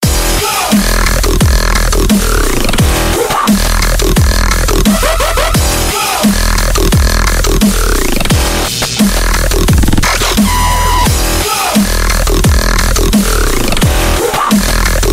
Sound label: EDM